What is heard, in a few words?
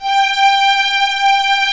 String Vlas Sect Agitato